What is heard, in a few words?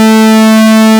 J60 PWM; E-MU Formula 4000 Series Vol. 2